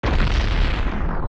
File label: Fire Burn; Anubis